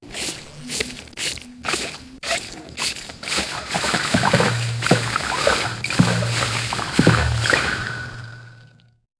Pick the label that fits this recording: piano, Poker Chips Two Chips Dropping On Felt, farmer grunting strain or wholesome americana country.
farmer grunting strain